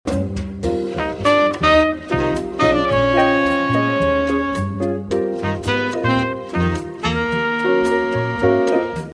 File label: melody